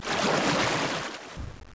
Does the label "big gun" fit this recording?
Yes